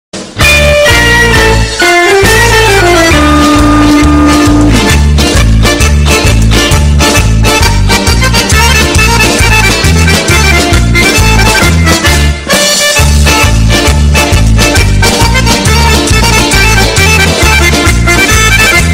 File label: Loud Italian Music Italian Ringtone